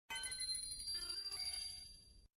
notification; SAO

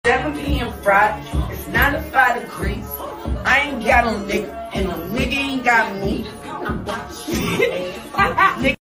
singing; meme

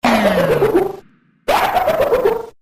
laugh; sonic

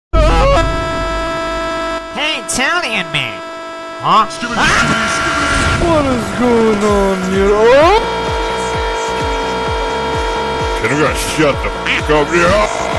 screaming; Pizza Tower; meme; Skibidi Toilet; TV Woman; Cameraman

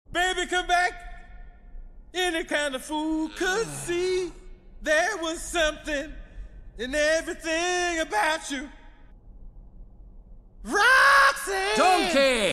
Donkey Sings; Shrek 5

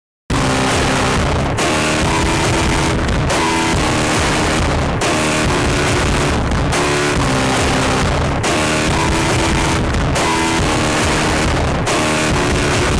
epic wubbox real sound; made with Voicemod

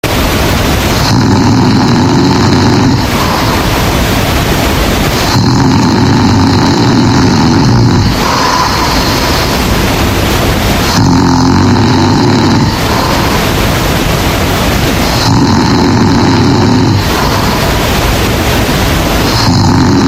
Earrape Snoring

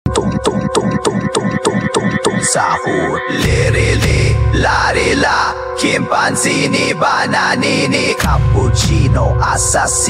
ITALIAN BRAINROT Emo Music

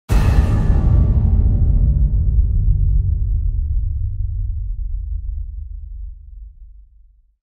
big hit

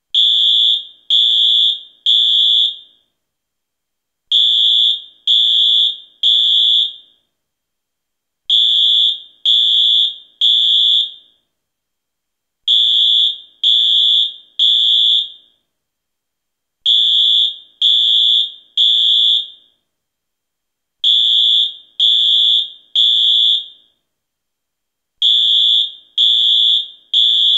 fire alarm

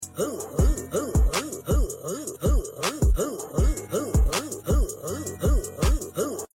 Eugh eugh eugh; meme

